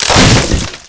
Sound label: break shingles; hits; Tony Hawk's Project 8; XBOX